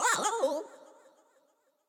Bark; Mombo; Super Mombo Quest

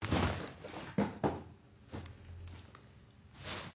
Hands out loop

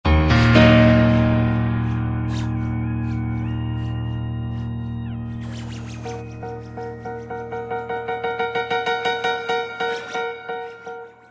Game over short; metalheart replicants rampage

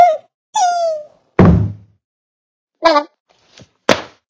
Football head poke fall

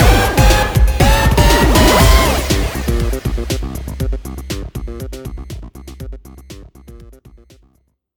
Gameshow

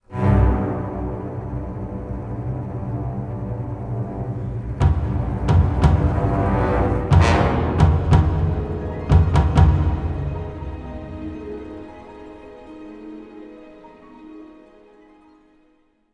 Tension; Star Wars Obi-Wan

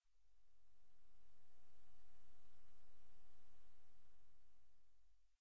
Ufo Idle; cut the rope time travel; android

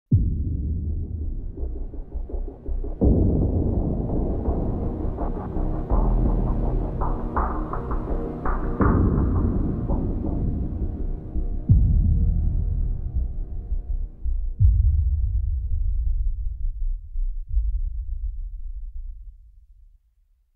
Suspense Theme

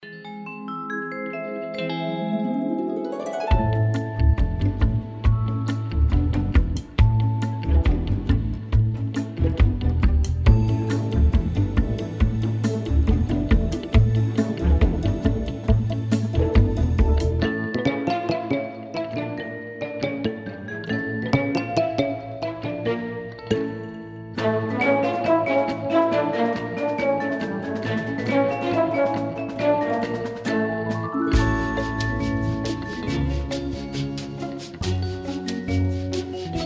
Bgm Ingame

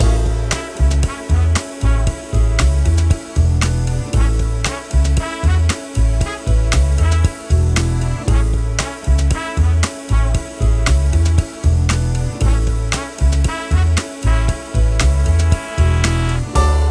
Half Time Seamless Loop; Dino Dini's Kick Off Revival; PS Vita

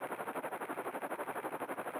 Event- Train loop

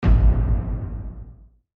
Shield Lost

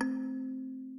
Kalimba

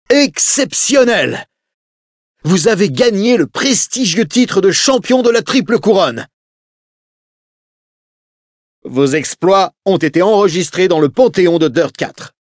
Video Victory

